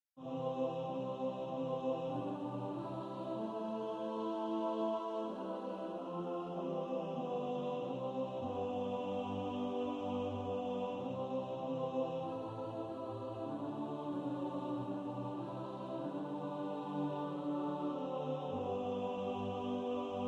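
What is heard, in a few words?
alto, practice music; Rejoice the Lord is king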